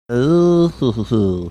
making funny sound

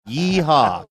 yeehaw

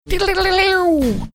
making guitar riff sounds

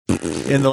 making a mouth farting noise

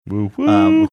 woo whoo

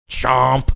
chomp

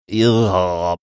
making sound of being disgusted

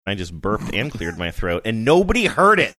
just burped and cleared my throat

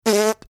making lip sound used when playing trumpet

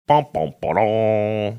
making sad horns sound

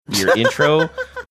cute laugh

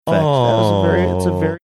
awwwwww

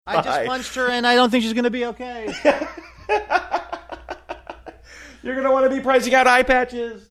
simulating doppler effect; saying i just punched her and i dont think

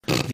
snorting

